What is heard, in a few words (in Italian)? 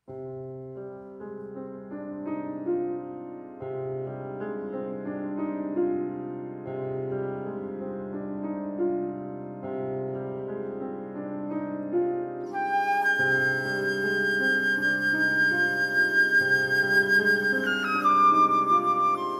per flauto e pianoforte